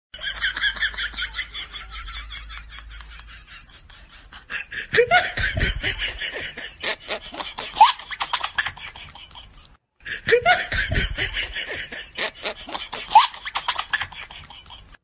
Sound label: laugh; breadradio